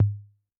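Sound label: Ana 1 Floor, Tom, Yamaha DTXpress-II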